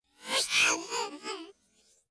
criatura

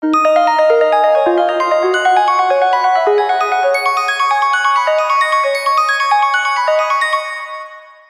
tono de llamada Samsung S11 Crystal Blue

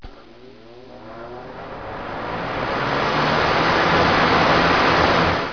ventilador mecanico

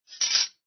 trampa metal

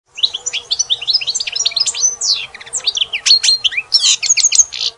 canto de un jilguero

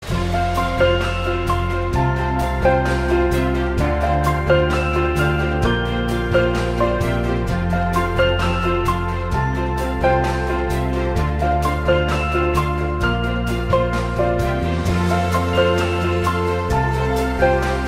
Samsung Galaxy S8, tonos de llamada

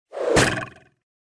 flechas volando

